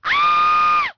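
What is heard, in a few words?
female scream; People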